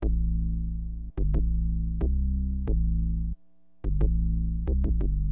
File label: BASS, HipHop; LiveAct Pool 1